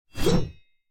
Heavy Blade Swing; Scrap Weapons; Zomboid

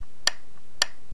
switch lamp fail; Zomboid; tsarslib; commonlibrary

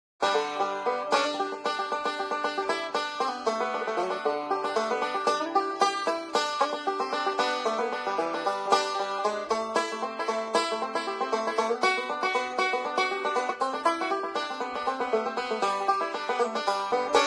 Banjo; Zomboid; Lifestyle

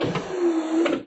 Headlight Open; Zomboid; tsarslib

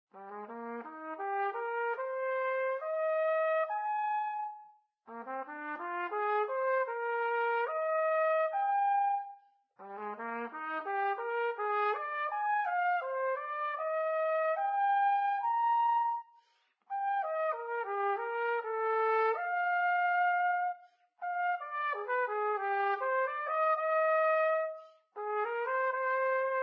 Trumpet; Zomboid; Lifestyle

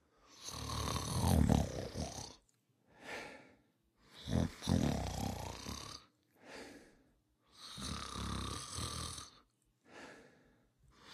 snore; Zomboid

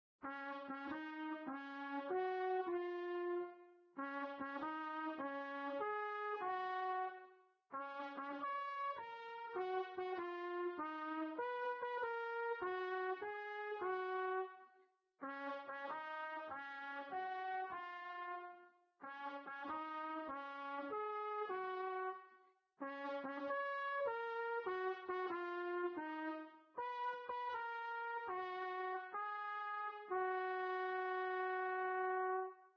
Trumpet Happy Birthday; Instruments

